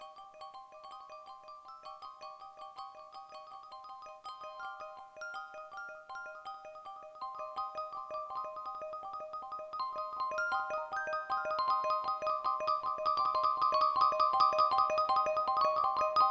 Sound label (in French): vibraphone rebondi